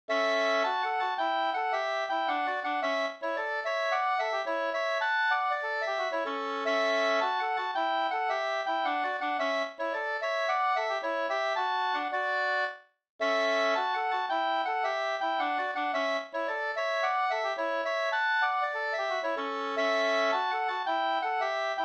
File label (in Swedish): polska, 2st; Anders Pettersson Hulared SvL Vg202